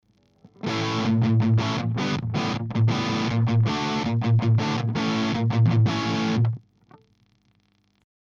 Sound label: rhythm; SM57